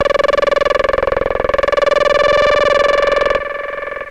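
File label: monotron delay - dm - 91, synth loops; instruments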